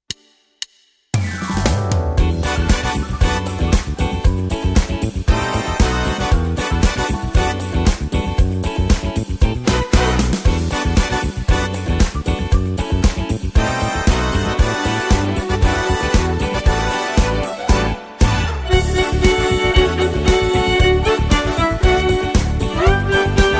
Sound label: jingle bells